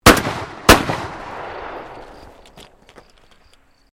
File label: SHOTGUN 12 GAUGE PUMP ACTION FIRING 2 SHOTS, Sporting Shotguns